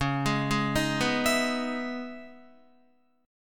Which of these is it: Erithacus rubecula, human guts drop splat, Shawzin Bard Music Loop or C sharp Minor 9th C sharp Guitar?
C sharp Minor 9th C sharp Guitar